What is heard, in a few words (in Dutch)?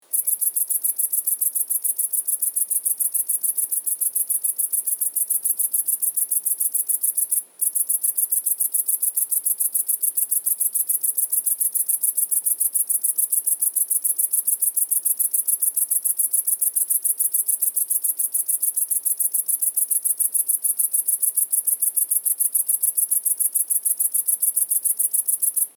sprinkhaan; ringtone